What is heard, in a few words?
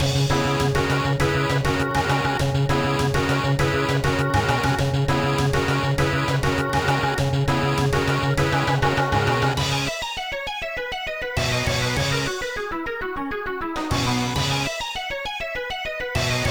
Stage Loop